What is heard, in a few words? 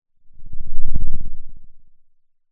Sound of a lion’s roar